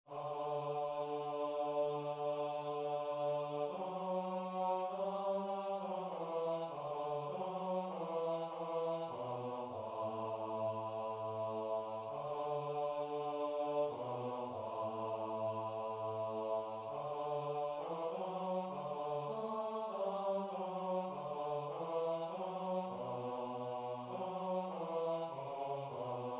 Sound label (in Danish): Bas; Dejlig er den himmelblå